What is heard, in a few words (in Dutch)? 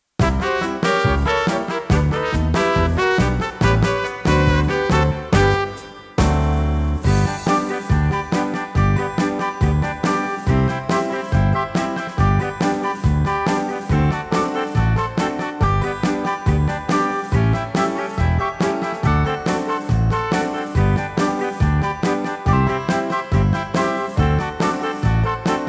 muziek zonder zang; Beren; Bieb Almere